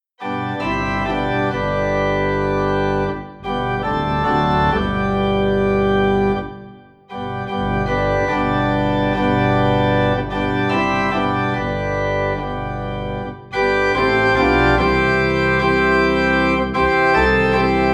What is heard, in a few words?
Organ Low in G